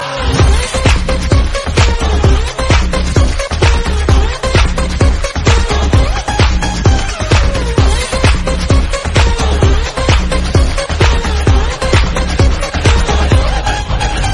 Remix Tamil Ringtone; Mankatha

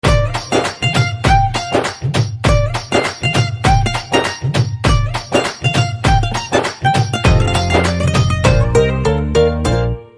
BGM Telugu Ringtone

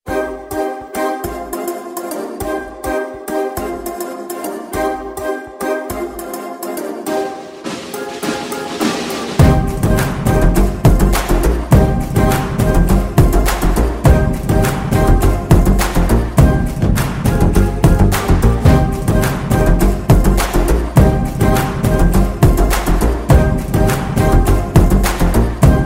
Drum Beats Telugu Ringtone

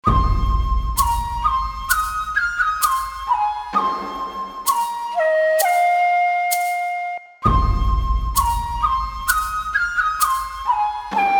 Quirky Ringtone

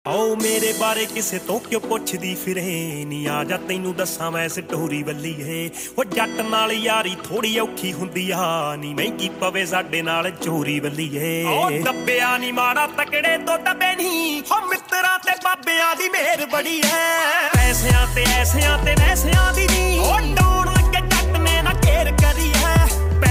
Punjabi Beats Ringtone

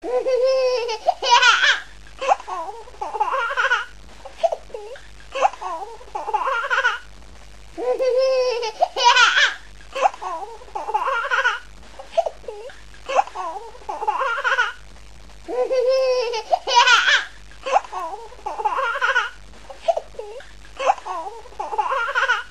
Baby Boy Laugh Ringtone

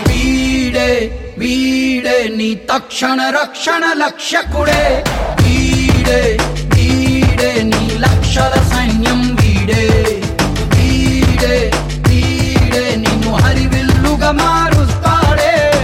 Telugu Ringtone

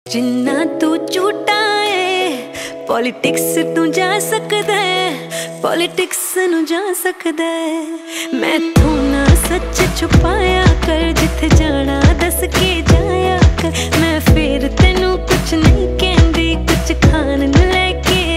Punjabi Beats Ringtone

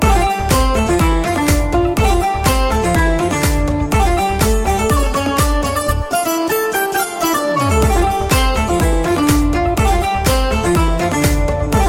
Instrumental Tone, Telugu Ringtone

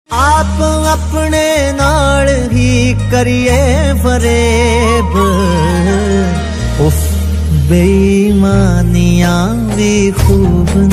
DJ Punjabi Ringtone

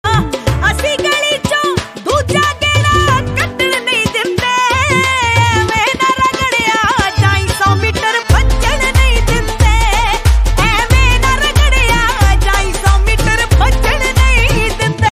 Ringtone

